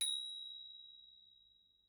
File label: ching, Gongkang Samples